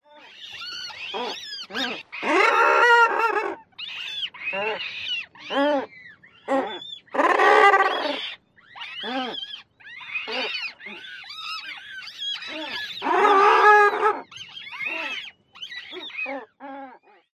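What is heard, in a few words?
Common Murre squeals of chicks and adult trumpets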